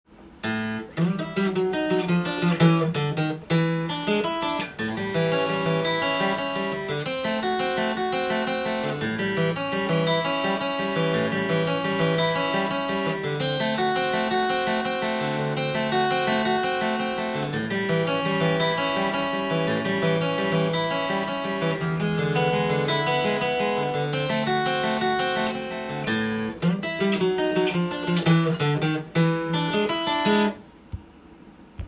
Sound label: blues arpegeo, acoustic guitar